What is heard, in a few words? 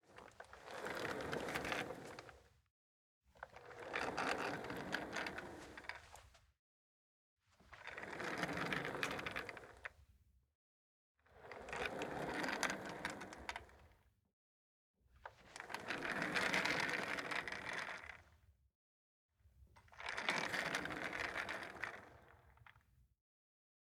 Office Chair Rolling Moving Hard Floor Rattle Adjusting